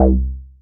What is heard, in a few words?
Synths BASS